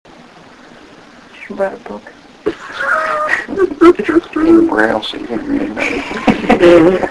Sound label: Crying; a voice which seems to sob during our conversation. (Has been suggested that this might be laughter); Public Locations